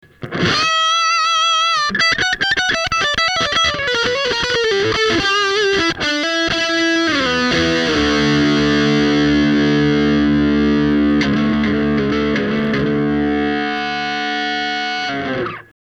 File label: pd-5 rock